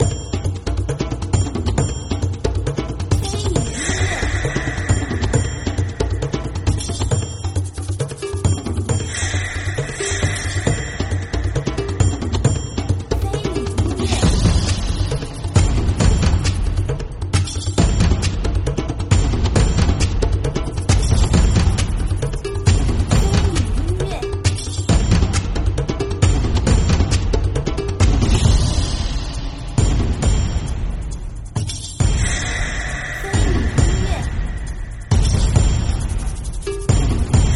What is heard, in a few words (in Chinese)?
战斗音乐